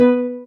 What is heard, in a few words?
soft hitwhistle; osu